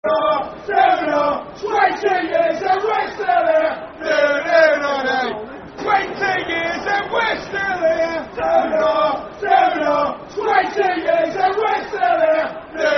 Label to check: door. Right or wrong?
Wrong